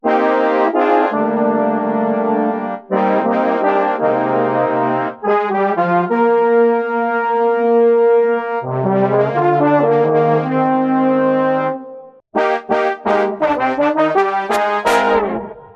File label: BONES ALL; multitracks